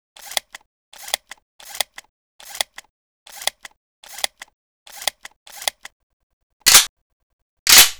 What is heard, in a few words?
reload, weapons; shotguns; 12cal; boito